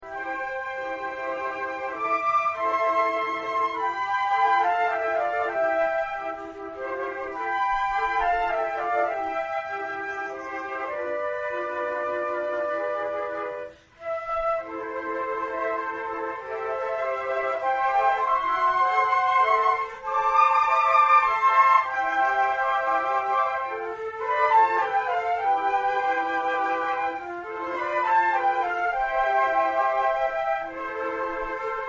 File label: concert, flute; AUTUMN concert 2016